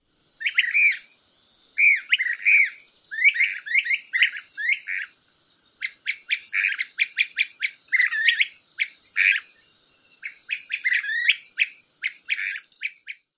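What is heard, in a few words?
excited calls, Brown-crested Flycatcher; AZ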